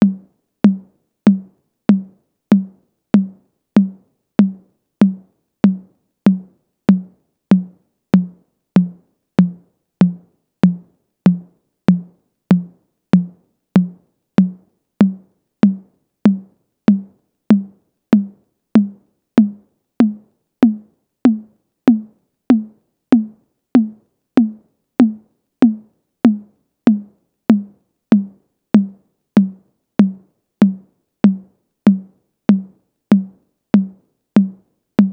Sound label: TR-808 High Tom; Roland; Instrument samples